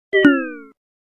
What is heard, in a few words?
ColorOS Realme UI Low Battery Sound; ColorOS 6